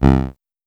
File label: Casio VL1 ADSR; Giga Samples Collection